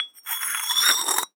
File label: Hole Saw Scrape; Hole Saw Bits